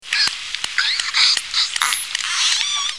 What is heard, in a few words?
tursi pls